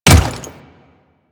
Default Step, Footsteps; Royal Guard

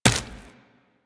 Run Flesh, Footsteps; Warframe; Tenno

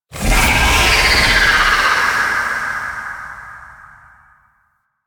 Vocal Shriek D; Enemies; Warframe; The New War; Archon; Nira

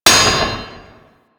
Worker Hammer Impact Metal, weapons

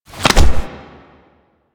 Glyph Game Push Foley; Drifter; Warframe; Duviri; Glyph Puzzle

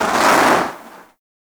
Eruption Fire Extinguish; Sargas Ruk

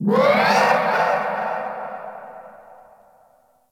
Night Distant Creature Large; Ambience; One Offs; Warframe; Eidolon Plains

